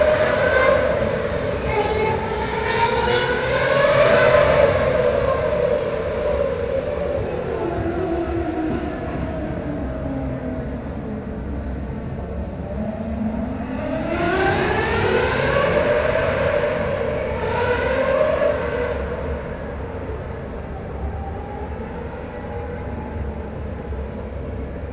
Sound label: F1 Car